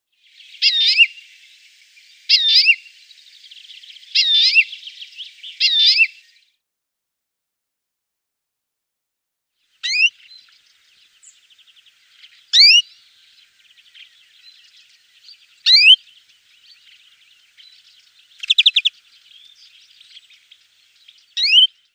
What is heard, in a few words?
European Greenfinch calls